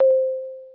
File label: Keyboard Beep